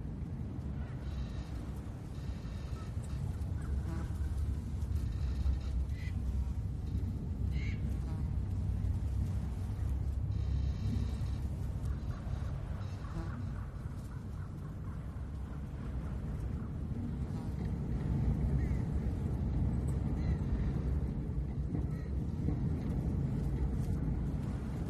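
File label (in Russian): ветер на мосту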